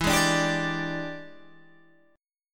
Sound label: E 7th Sharp 9th Guitar Open G, chord